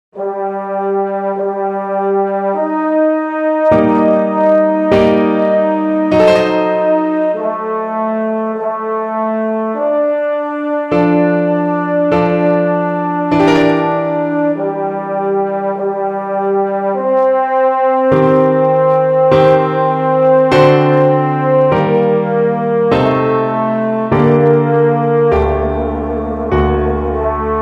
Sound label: EUPH, euphonium